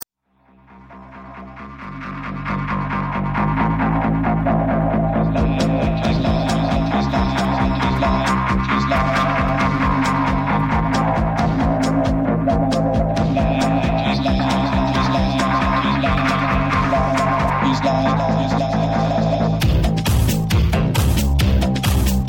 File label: Phase